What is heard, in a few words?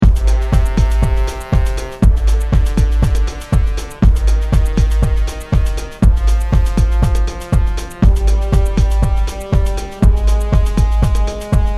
ABCDEFG Eb